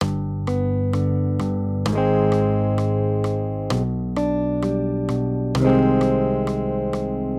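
Accompaniment, Major Triads, G-Ab, 130 BPM, guitar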